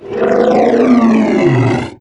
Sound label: sight, doom3 pinky